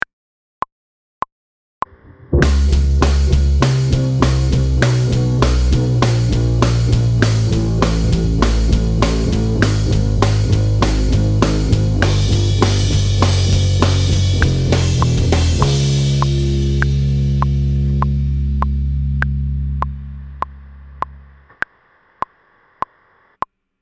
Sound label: no guitar